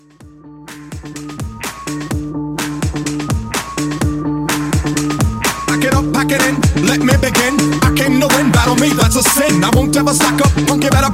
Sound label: Old School Mashup 126